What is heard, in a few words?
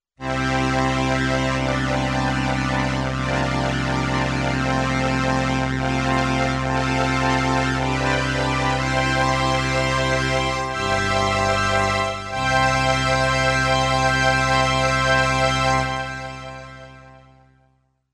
STRINGS I II BRASS w ensemble